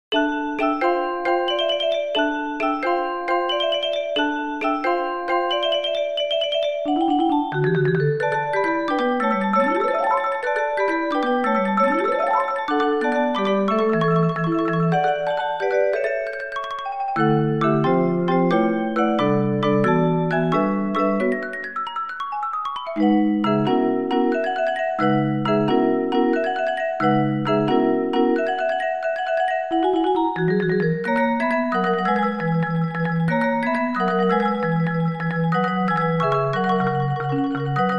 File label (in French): percussions; pieces pour percussions; triade 2 sumotori string